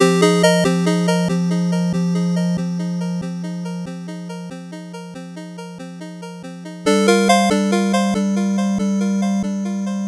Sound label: 8bit bgm slow